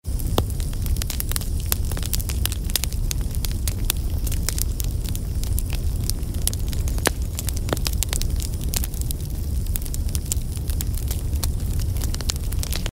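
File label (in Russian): костёр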